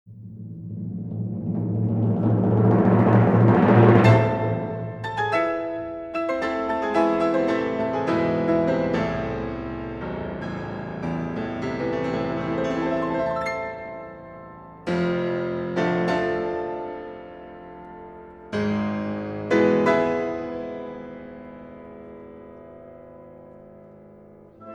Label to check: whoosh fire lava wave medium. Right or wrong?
Wrong